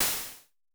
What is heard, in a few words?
Snare Crash, Vermona Mono15 Drums